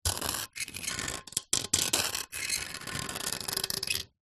foley concrete scrape with metal sharp

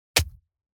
Gore Stab Punch Hack; Just Gore 2; soundbits

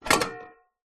rice steamer lid remove; household

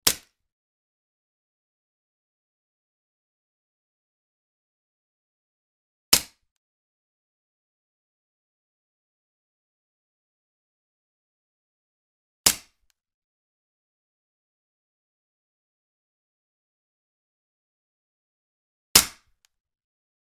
weapon gun walther ppq; still north media

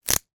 horror gore knife twist body crunch; zapsplat

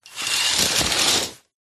household curtains old draw pull

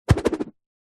throw object spin whoosh air; foley

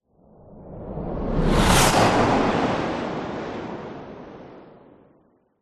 science fiction space fighter fly past fast

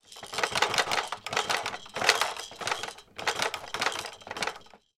toy baby walker plastic shakes

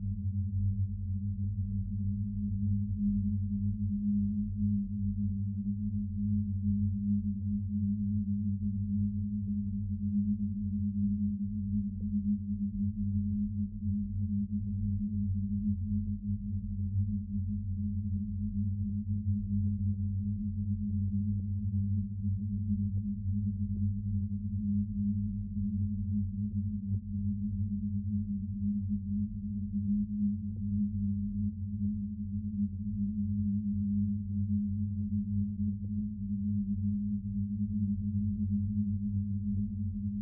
Drone waivering 2-octave hum

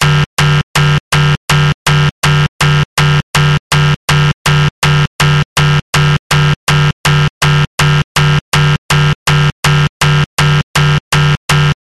science fiction alarm loop

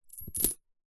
money coins small x4 drop carpet; foley; zapsplat

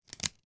household wall plug remove from pack

